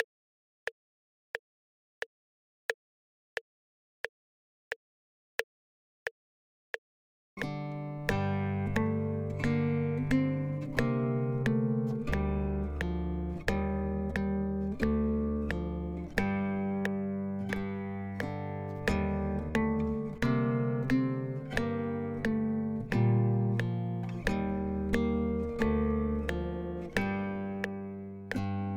guitars and click track

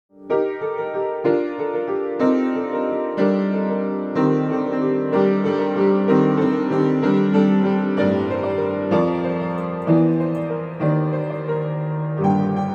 before and after tuning

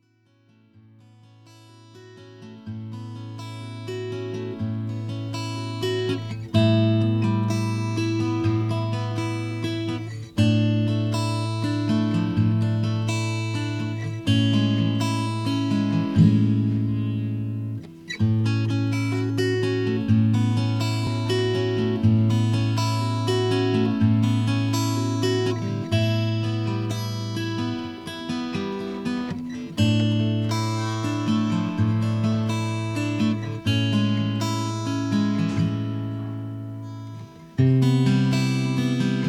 Acoustic Guitar Stereo track

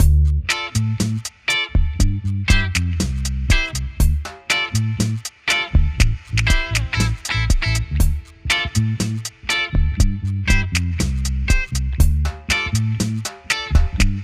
8 bars reggae

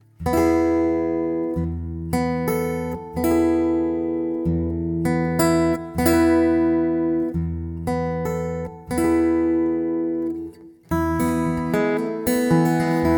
line in w di box EQ and reverb

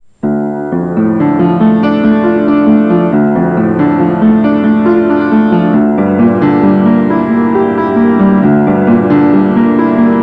Yamaha P2 piano tune before then after tuning

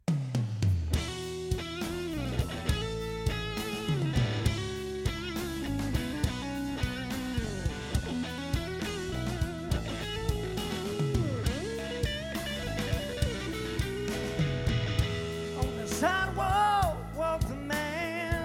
raw 2 track unmastered

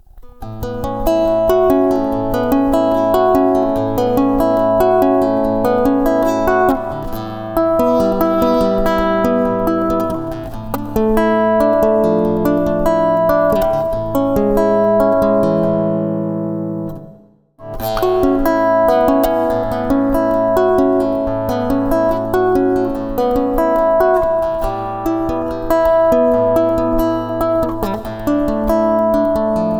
tryout on classical

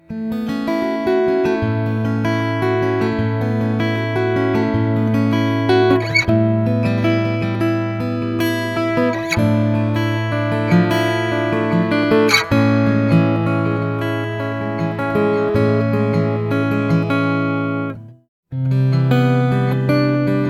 KK pickup

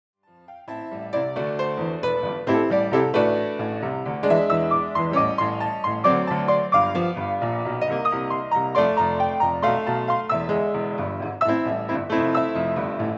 blues trio, Piano Recital Snippets

